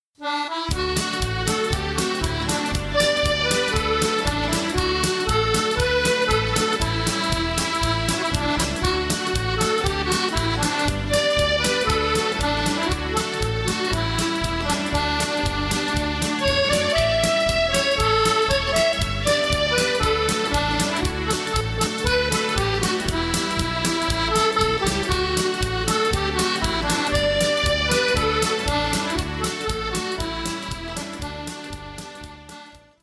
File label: irish medley, piano accordion